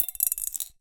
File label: Drop In Glass, Medium Wine Glass